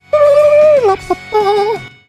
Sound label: singing